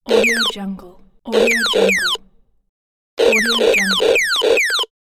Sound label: child toys